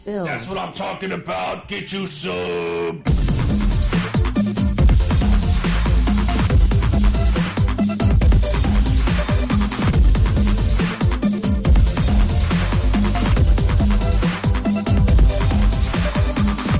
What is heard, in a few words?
beat